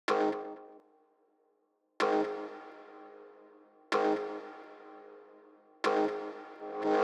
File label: COUNTER STAB